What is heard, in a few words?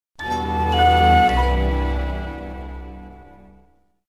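Sting Start Light; Family Guy Back to the Multiverse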